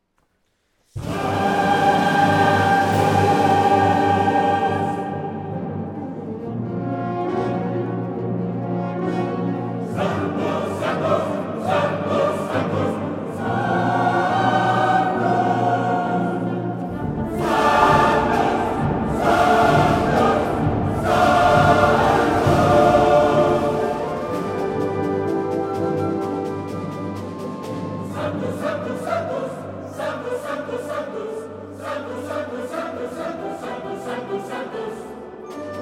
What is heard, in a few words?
Wind Band Misa Sanctus